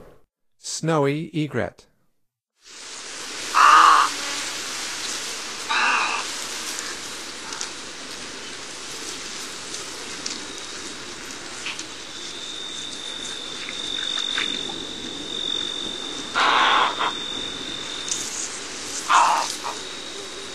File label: Snowy Egret; North America; Stokes